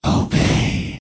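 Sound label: Spirit Mausoleum Possession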